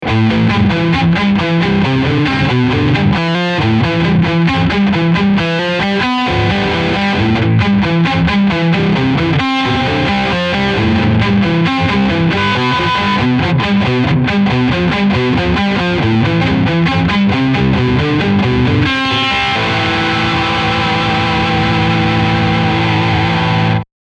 OD Sound

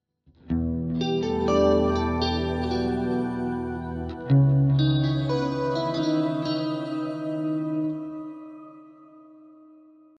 Clean Shimmer

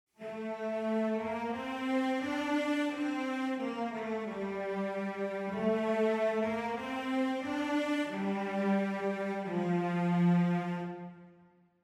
Celli Legato; Audio Imperia Areia

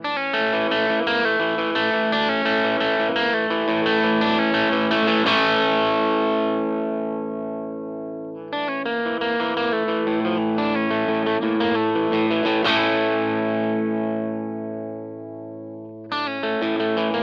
Harley Benton DC Junior Fat Volume Poti

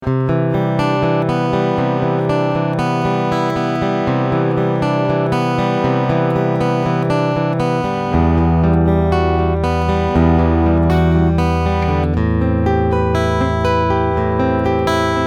PRS SE Hollowbody II Piezo Picking Piezo

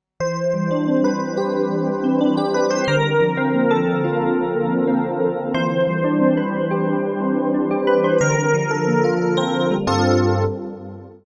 Montage

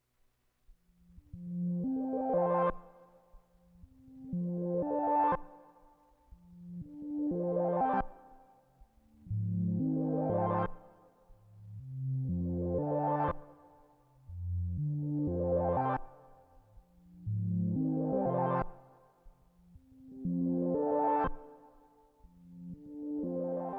Subharmonicon

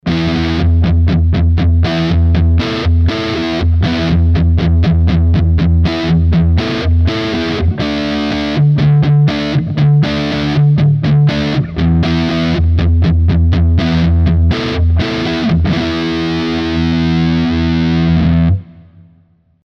Framus Diablo Pro Neck Humbucker Highgain Rhythm

